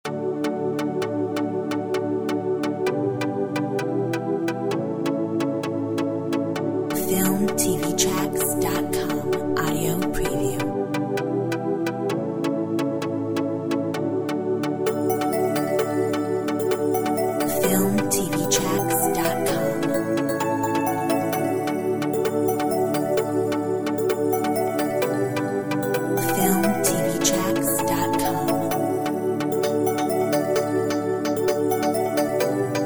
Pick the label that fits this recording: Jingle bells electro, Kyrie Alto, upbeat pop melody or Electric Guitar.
Jingle bells electro